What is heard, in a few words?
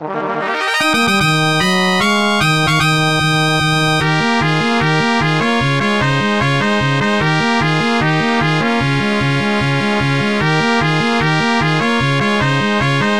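Battle Victory, MIDI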